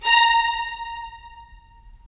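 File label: strings violins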